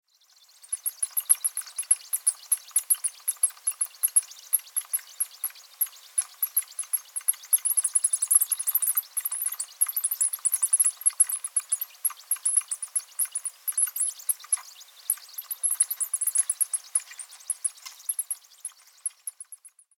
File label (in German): Feldspitzmaus Laute